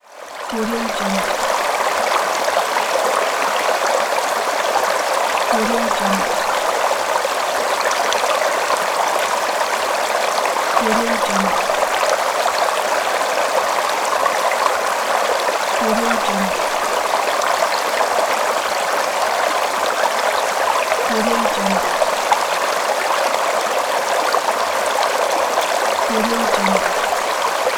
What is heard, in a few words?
forest stream